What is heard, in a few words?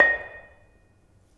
Bonang Panerus harder, gamelan; gravityharps; timbrebox